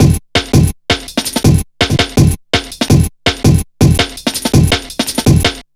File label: 165, Drum Loops, Drum & Bass; Total Drum&Bass